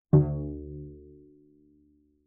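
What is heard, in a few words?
Cello pizz fortissimo sul C D2; MIS Pitches - 2014; Strings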